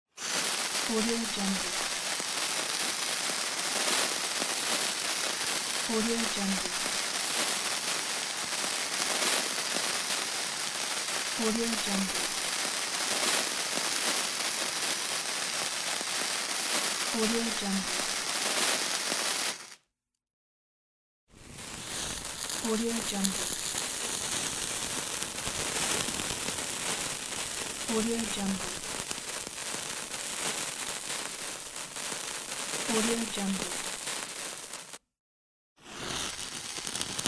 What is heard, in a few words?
burning wick